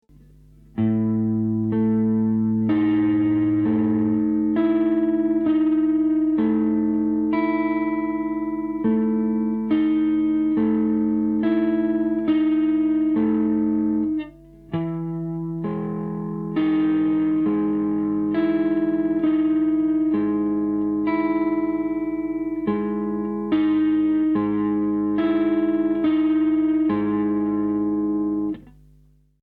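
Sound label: slow, rock guitar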